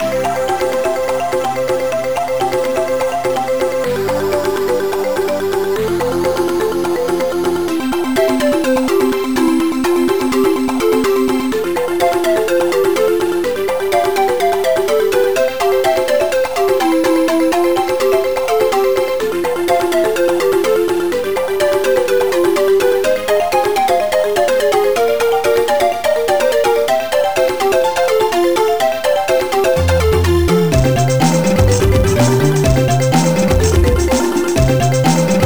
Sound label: drums; the humans 1 2; CD32; Amiga